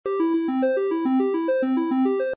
reel run; games; poker; American Poker The Legends II

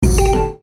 stop bonus; Book Of Lords

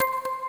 crystal scatter; Lucky Fortune; Wazdan

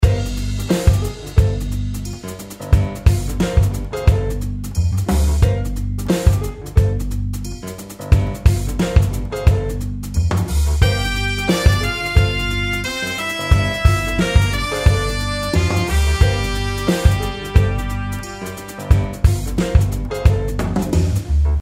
speakeasy intro bgm

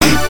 bonus chance; Lovely Lady

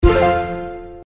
scatter 3; Horoscope

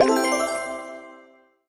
bonus high symbol; Fishing For Gold

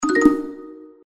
hit; Primeval Rainforest

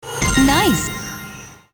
nice win; Gem Star

